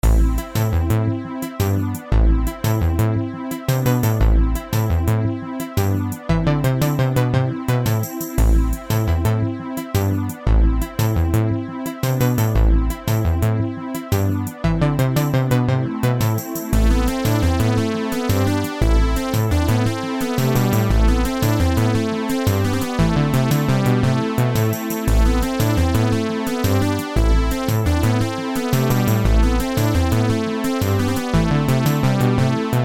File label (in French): Shuffle; 80s